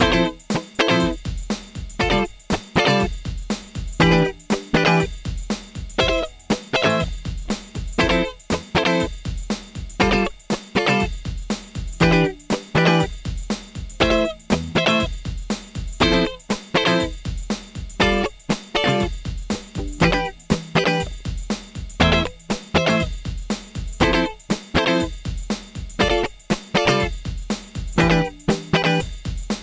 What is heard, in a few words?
cutting original